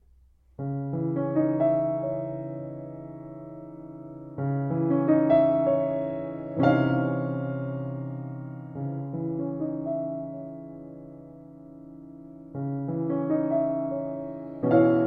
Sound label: sonata for violin and piano adagio